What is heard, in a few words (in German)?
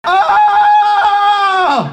aaaaaaah, Ausruf